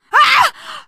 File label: hurt critical, teen girl, survivor voice; source engine; left 4 dead 2